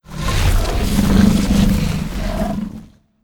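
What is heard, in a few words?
Hydra voice; Legend of Keepers (gamerip) (2019)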